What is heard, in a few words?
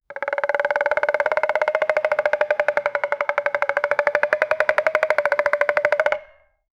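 a vintage whistle, game show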